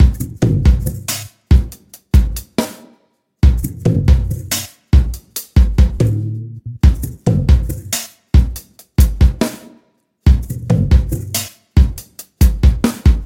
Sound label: loop 70 bpm; RedBall